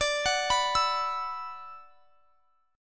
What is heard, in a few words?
D 6th Add 9th D; D6add9 arpeggiated; x,x,24,23,24,24